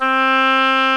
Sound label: Oboe C4; Indiana Jones Demo; iMuse